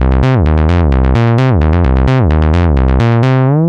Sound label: acid house 303 style squelchy bass 130 bpm; 2 bars